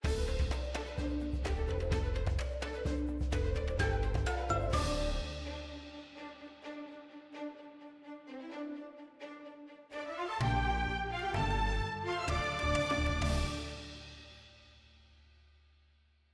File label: Music Cinematic; Chapter3 SawtoothWreckageOutro; lego horizon adventures ps5 switch windows gamerip 2024